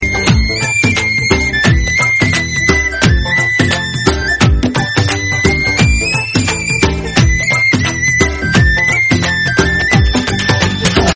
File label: BGM Telugu Ringtone